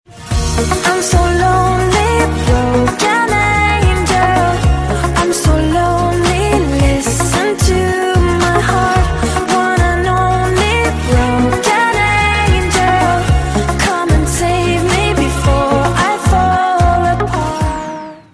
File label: Mobile ringtone